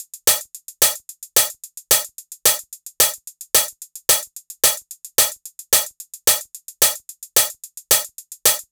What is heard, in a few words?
Nu Disco 110bpm Hihats; EDM Drums; Drum Kits; Stems; Ghosthack; Ultimate EDM Drums; Nu Disco Kit 09